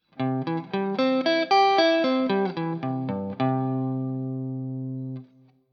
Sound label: C Major Arpeggio Shape, guitar